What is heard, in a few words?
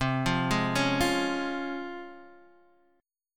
Db Major C, Db/C chord; arpeggiated; JGuitar